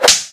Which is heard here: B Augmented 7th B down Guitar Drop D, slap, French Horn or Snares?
slap